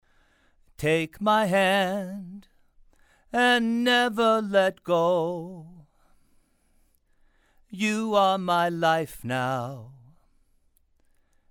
Male Vocals RN17

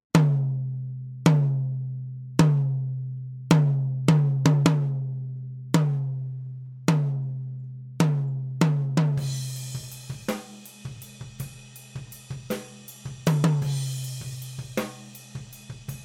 Tom Microphone Z5600a II

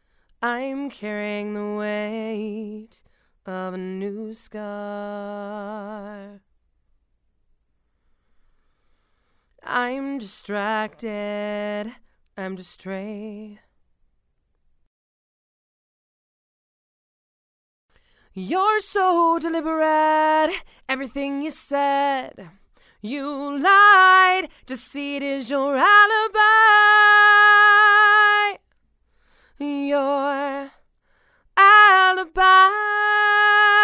Female Vocals

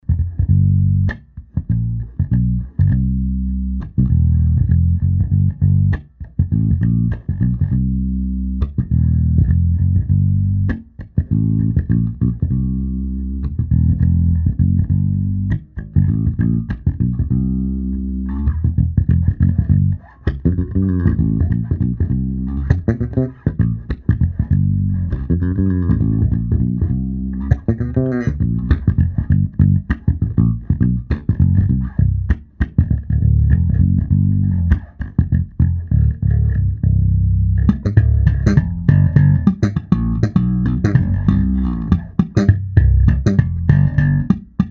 AMP Bass RNR1

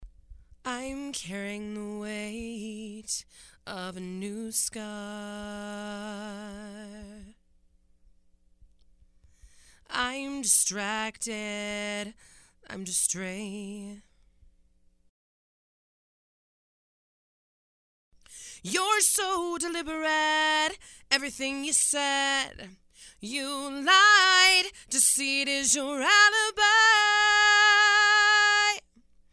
Female Vocals

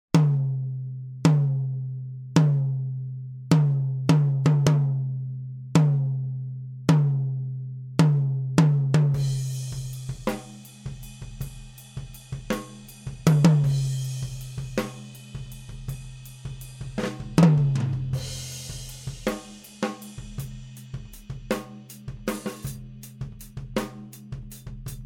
Tom Microphone C212

